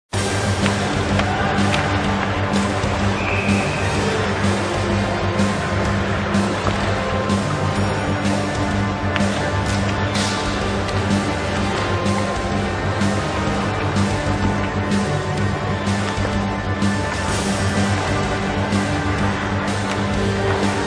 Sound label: Normal Bgm; hockey